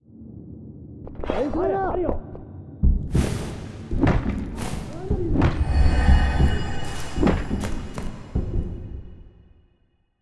stone tablet; cutscene 2-3

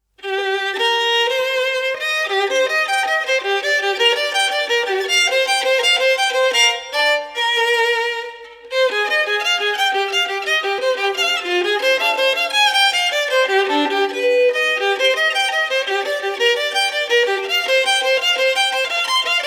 Violin Solo; eisai kyouiku original soundtrack; Suki

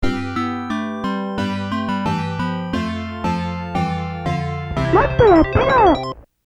Game Over; PSYCHO SOLDIER

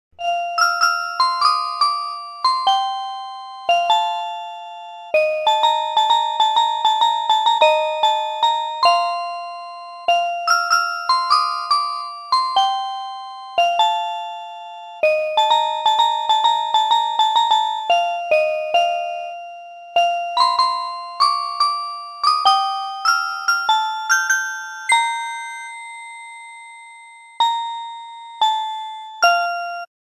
Music Box; Harvest Moon 64

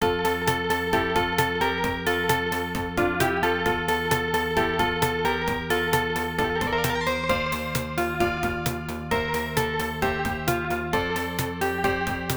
Loading BGM; Xadlak; X68000

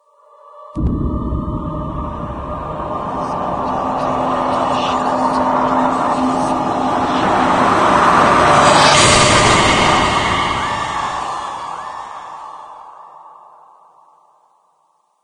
Suspense; Doom; PS1

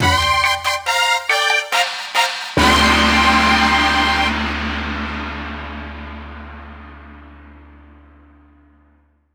High Ranking; Bomber Mansion; bomberman jetters

